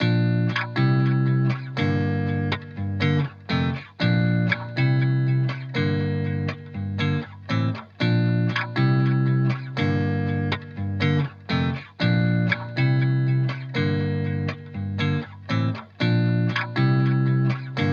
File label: 120 BPM A# Maj Main Guitar; Cymatics; Miami Guitar Pack - Pt 1; Frail